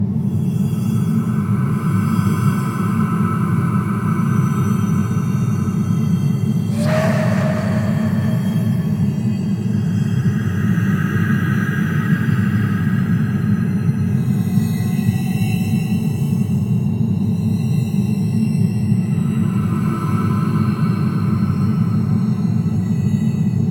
Ambience Forest

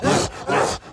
Enraged Breathing Loop; The Town Sam Clip Burns; Devour 2021 gamerip pc macos

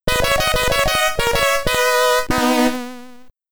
Get Ready! (SID Stereo)

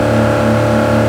Porsche 911 Gt3 Rs High, car; gamerip; Car Simulator 2; android

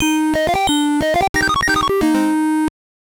Jingle, monopoly gbc

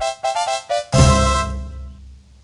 goal; Gnomeball

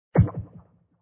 place Tower; Bubble Tanks Tower Defense

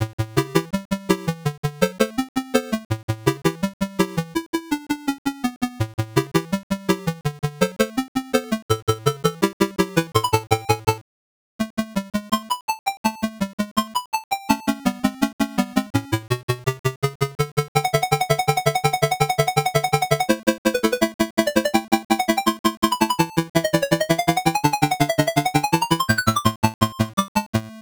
Piano Piece; Maniac Mansion; Tandy 1000

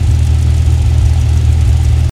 Urus Idle

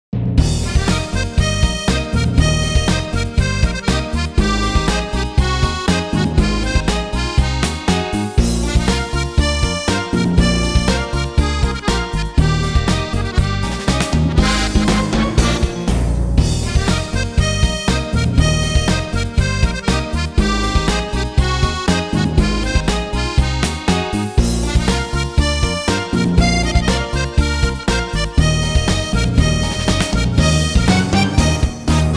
Tea House

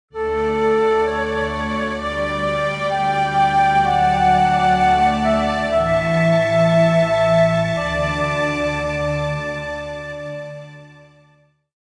Industrial Calm; Civilization Revolution

